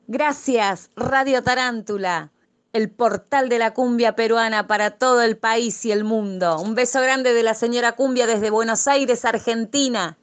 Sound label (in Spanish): soy la senora cumbia